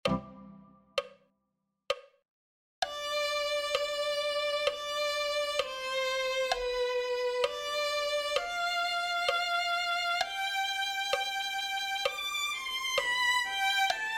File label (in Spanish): violin